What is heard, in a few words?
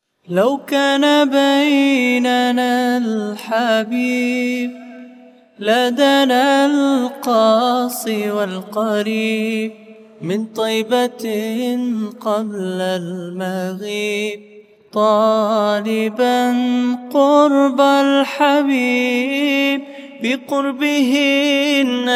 Vocals Only, nasheed